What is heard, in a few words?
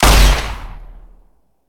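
weapon plasma defender fire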